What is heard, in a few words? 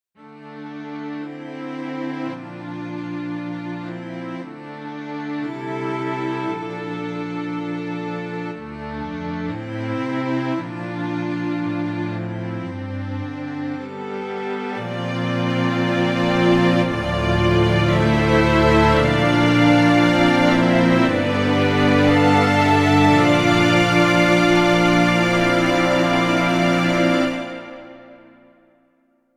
a few strings; montage; cordes